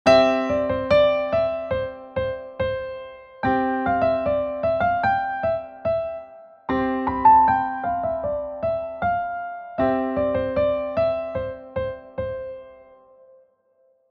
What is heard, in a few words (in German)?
ionisch